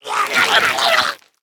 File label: Curious Glimmerroot, Play